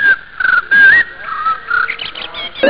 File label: Jungle birds; jazz jackrabbit 2